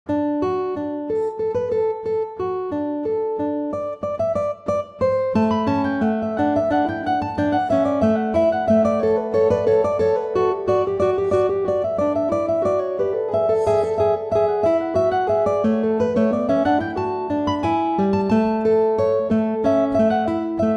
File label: Duo Acustic Guitar; Duo for Classical Guitar (originally duo for trumpet); Pianoteq